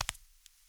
Tile; blindside

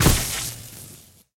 Lightning Tank Footstep; AudioWizards

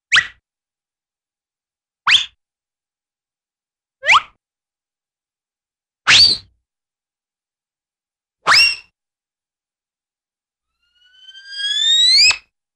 Slide Whistle For Streak, Cartoon; Hollywood Edge; Cartoon Trax

